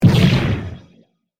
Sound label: Hit Phaser; Production Element; Sound Design; BlastWave